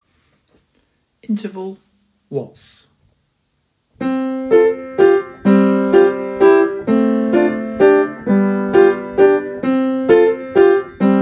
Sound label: Interval Waltz